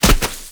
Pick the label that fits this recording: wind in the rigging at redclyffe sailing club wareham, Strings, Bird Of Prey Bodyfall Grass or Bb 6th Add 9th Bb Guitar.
Bird Of Prey Bodyfall Grass